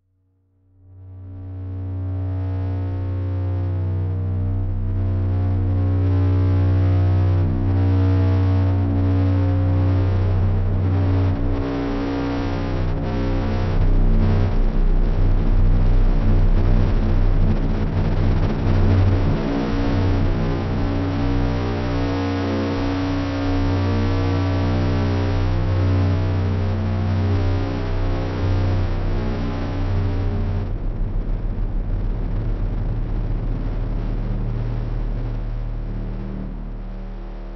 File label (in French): larsen, exterieur; pascal deleuze; websynradio